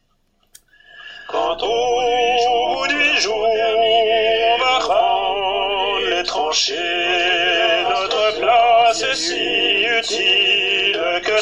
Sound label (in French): tenor